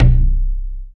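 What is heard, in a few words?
LOG drum; East West - Phat & Phunky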